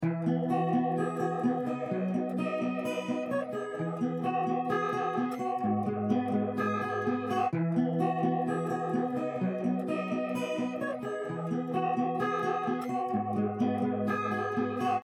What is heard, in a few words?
multiphonics stereo mod wet; multiphonics cv 2 audio fx; adam pietruszko; objeq